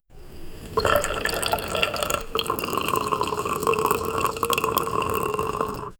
muted coffee pour; food and drink

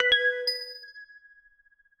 short audio logo

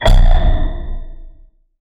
low pitched animatronic

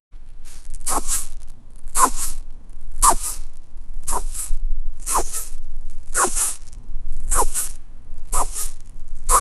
lizard walking fast; lizard walking fast, reptiles

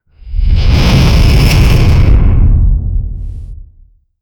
mythical phoenix roar; mythical creatures

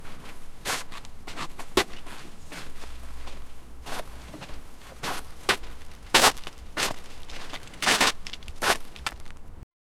ship

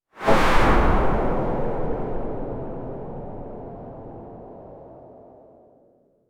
cinematic sci fi sting

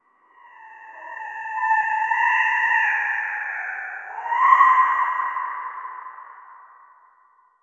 a creepy crow like voice, avian

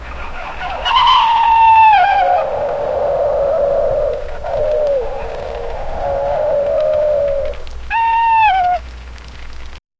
blizzard howls in arctic

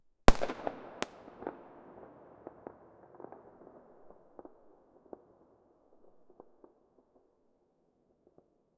single small firecracker, fireworks

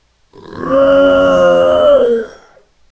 occasional anguished groa

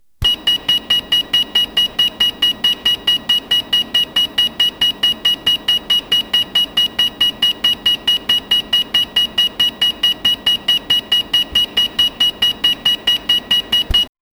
modern electronic cash re; commerce

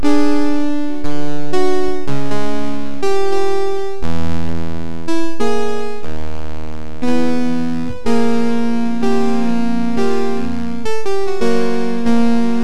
retro radio; 80s pop

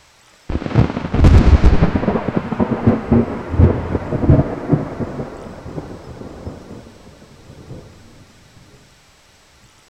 constant sky ripping

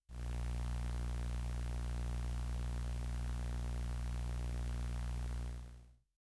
ambiance

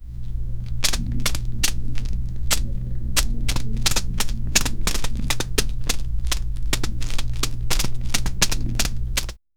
walking in the ground; animation

